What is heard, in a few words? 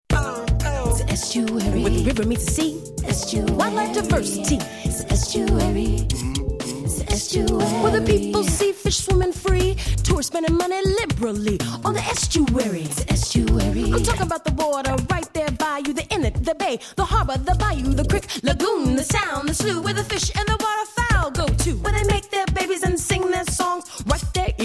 estuary hiphop